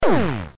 error; tacit

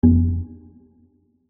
click; timberpig

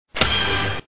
button

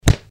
wrong move; petparty

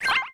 number Get; attackonball

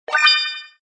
btn; snap

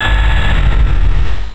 spike trap; jokingyou

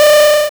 start game; minorminer

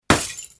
jelly break; petparty

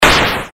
explosion; Asteroids-Reloaded

